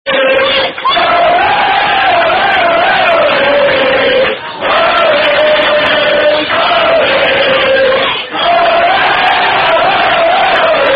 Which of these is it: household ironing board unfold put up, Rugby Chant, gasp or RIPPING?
Rugby Chant